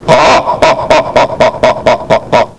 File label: laugh